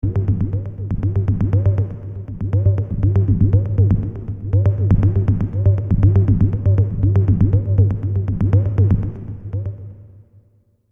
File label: bouncy fm patch